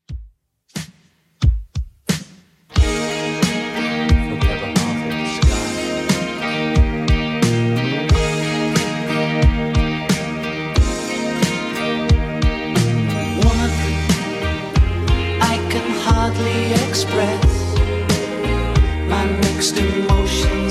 80s Redrum 90bpm CLEAN

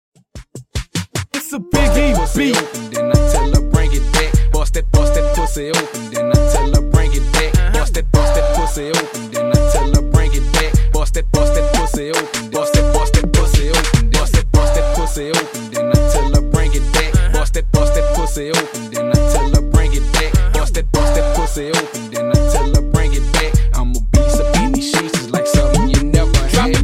Funk 75 Bpm

